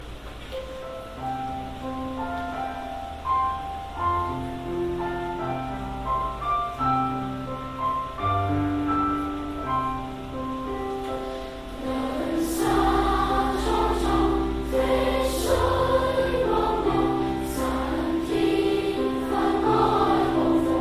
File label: with children voice